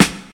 high quality smooth rap snare one shot g key, acoustic snare